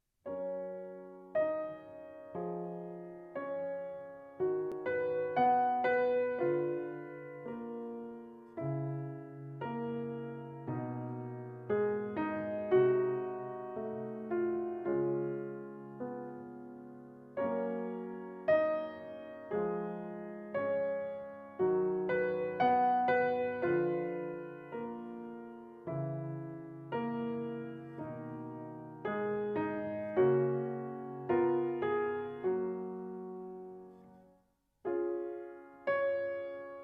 lament plainte klage; gurlitt cornelius

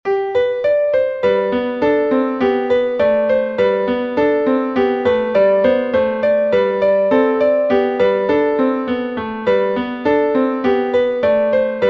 little study, piano piece; kalman chovnon

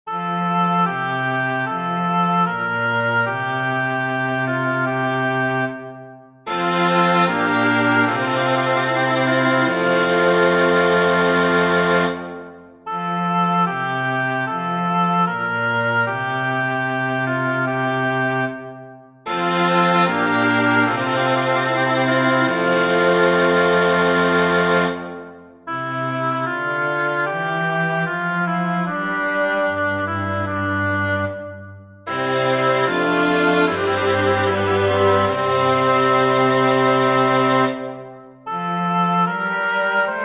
choral for oboe strings; bach johann sebastian; nichts ist lieblicher als

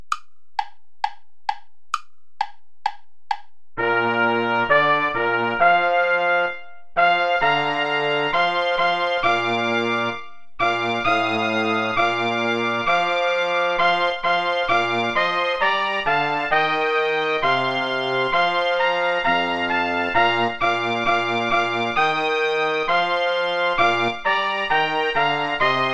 play along without trombone; schulz karl friedrich; danket dem herrn

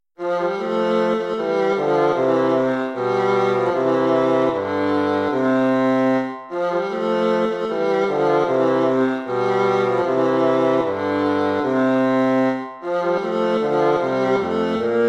bransle gentil; praetorius michael

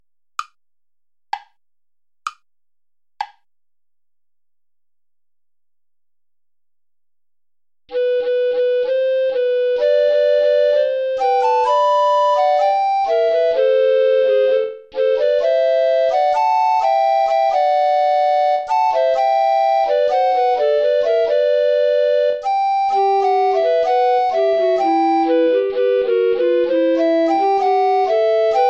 tango play along without guitar

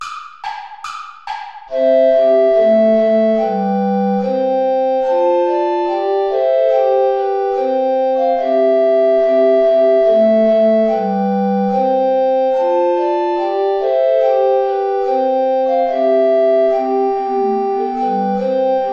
bransle royne play along without soprano recorder; praetorius michael

